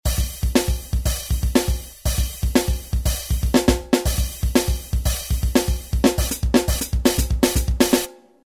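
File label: drums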